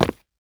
footsteps tile

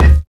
physics cube lg; Portal 2

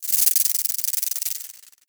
rattle death layer

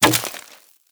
debris wood, bullet impact debris

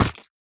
step run, footsteps, player, mud

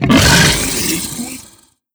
death, creatures, barnacle; Half Life Alyx

